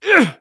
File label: Horseman Wound; svencoop; keenhalloween; horsemen